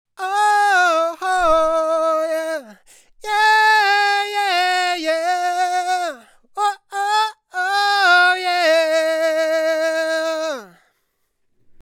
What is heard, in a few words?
Vocals; RME